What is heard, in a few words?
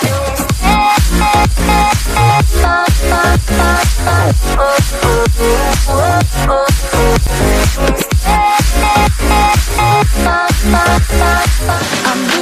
hot electro ringtone